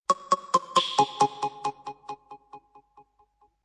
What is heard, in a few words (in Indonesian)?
Notifikasi Wa